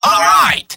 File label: scout positive vocalization; tf2; mvm